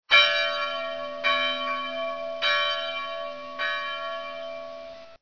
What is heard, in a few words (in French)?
cloche eglise